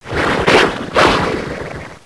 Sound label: gonome melee